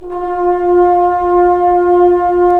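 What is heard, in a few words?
brass trombones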